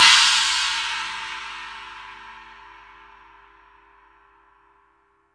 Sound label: CHINA, Drum Dry; East Collexion; Drum 1 Dry